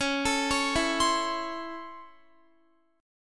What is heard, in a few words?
C sharp Minor Major 7th Sharp 5th C sharp Guitar; C#mM7#5; arpeggiated; x,16,19,18,16,19